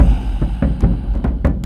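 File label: Music Shield; From Dust; ps3; xbox 360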